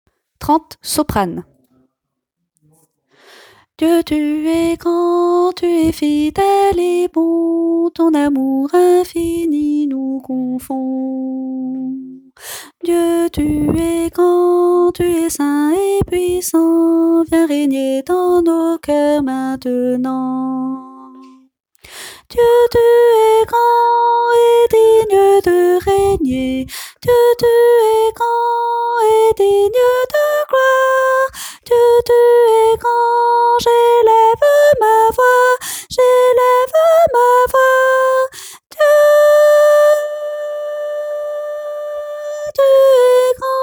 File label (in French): soprano